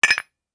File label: Beer mug cheers